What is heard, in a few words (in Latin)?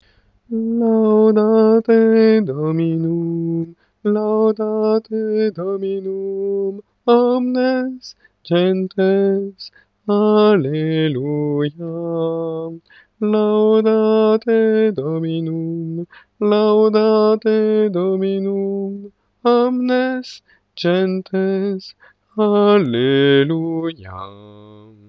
Laudate Dominum basse